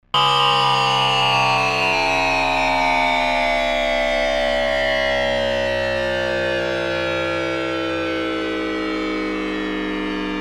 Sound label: filter env fm